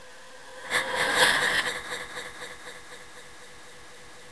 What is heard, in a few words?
scary